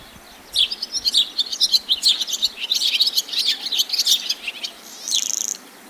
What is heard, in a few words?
swallow song; Swallow (Jaskółka)